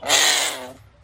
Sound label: growl